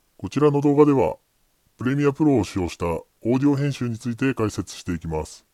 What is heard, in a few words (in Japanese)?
ピッチシフターセミトーン-5トラック適用